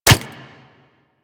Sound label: Nunchaku Catch; Warframe; Tenno